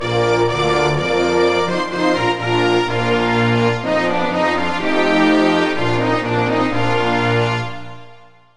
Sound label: Anthem Croatia; Buzz! The Mega Quiz; PS2